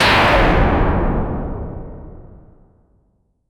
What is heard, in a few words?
neural network hit ai